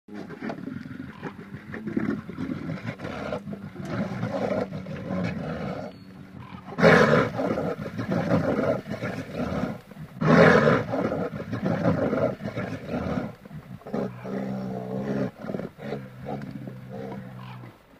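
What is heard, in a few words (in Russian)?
медведь рычит хрипит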